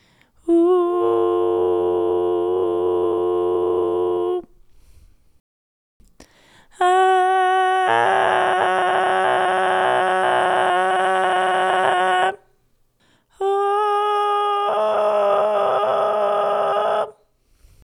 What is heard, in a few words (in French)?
Creaking m2 regulier; les effets simples